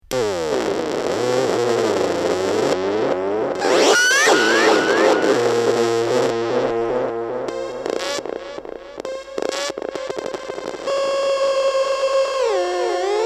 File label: Noise In Outer Space